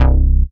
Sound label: Bass C, Offbeat Bass